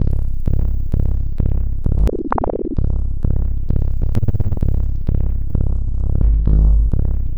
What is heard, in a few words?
Miami Bass Fuzz, Modular Electronica; Beats; Sonic Foundry (Sony Creative Software)